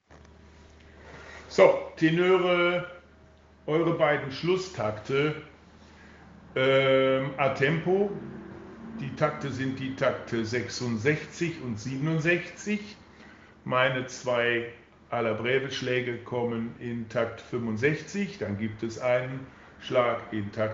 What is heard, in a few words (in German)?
BN Tenor atempo